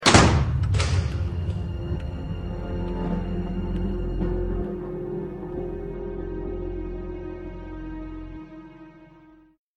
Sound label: End Staircase Sound; Slendrina: The Cellar 2; Android